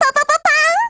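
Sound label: weapon get; m4mod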